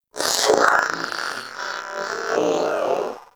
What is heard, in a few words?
Zanuka Companion Vocal Breath Walk; Pets; Warframe